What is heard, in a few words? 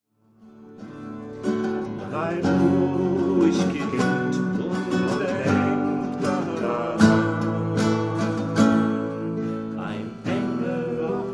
Trio Impro